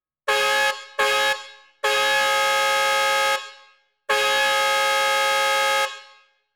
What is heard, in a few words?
Bullet